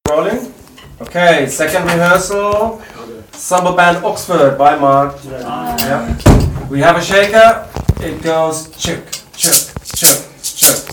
samba Intro

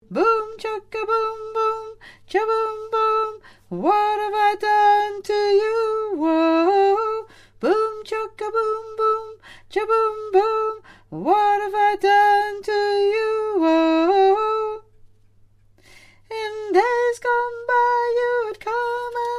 badger

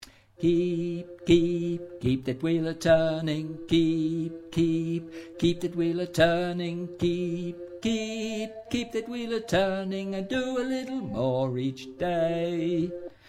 Chorus Voice Bass

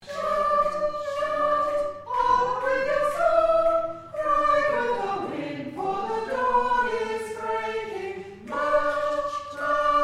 Sop Hi; March Women

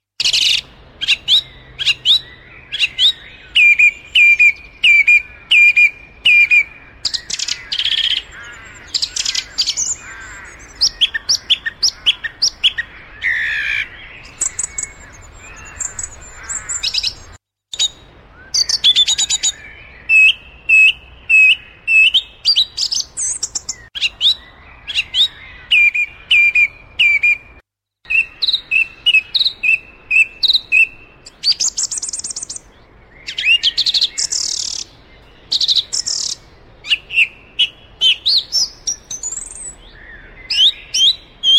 Song Thrush

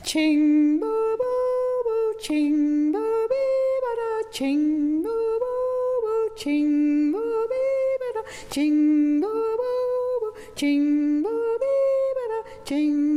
bass

